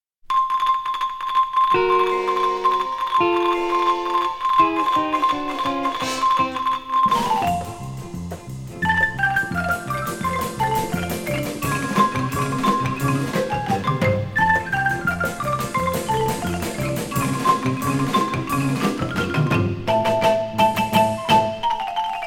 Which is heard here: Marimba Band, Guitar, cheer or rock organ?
Marimba Band